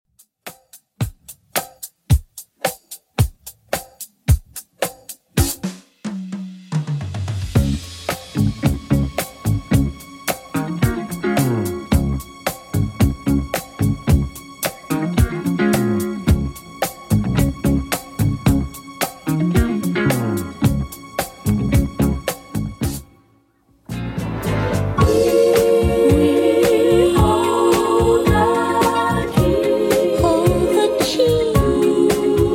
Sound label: 70s Redrum 110bpm CLEAN